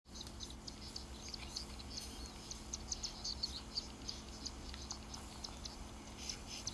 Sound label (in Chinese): 褐頭鷦鶯聲音